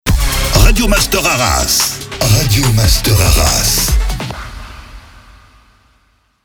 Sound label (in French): Jingle SPEED MEDIUM; Radio Master Arras; Habillage